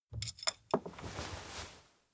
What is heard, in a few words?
bowl offer; anemoi